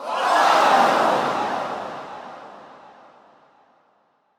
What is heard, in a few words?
Pain Empathy Crowd; Lunaro